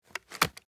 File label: end reload; tacz; daffas arsenal